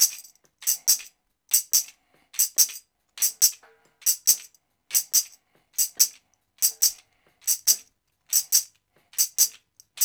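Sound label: TAMB, tambourine; Drumdrops In Dub VOL-1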